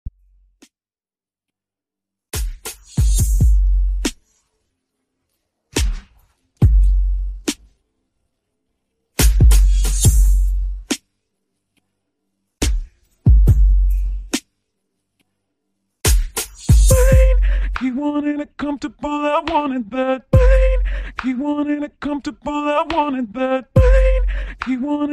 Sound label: Top40 Mixshow Intro 70 Bpm